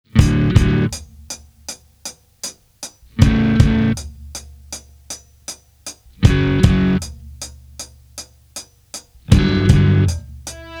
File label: Off Vocal Version; Furi Kuri; FLCL